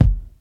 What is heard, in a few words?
Tight Lows Kickdrum F# Key, Royality free bass drum tuned to the F# note.